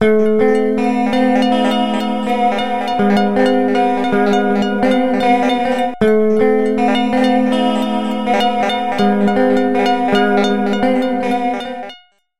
Stereo Flanger, Railroad Crossing Bell, Guitar Wet; Sonicircuit; Syntheway